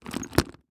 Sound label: Sports Bag Move Handle Bowling Bag Leather